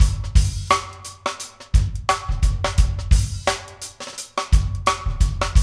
Funky Drums; AMG; Now CD-ROM (Roland); Neil Conti

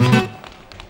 STL STRN BRK; Best Service; World Colours

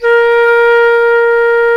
flute, C flute

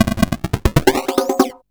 Crushed Perc (140BPM); musicradar

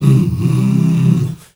MALE HUM; Spectrasonics; Heart of Africa

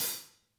hat, Vintage Timetraveler kits; Sonic Reality - Vintage Timetraveler

